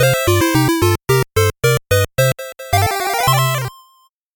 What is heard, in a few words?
8bit jingle normal